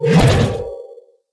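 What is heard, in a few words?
equip element; cstrike; valorant; reaver vandal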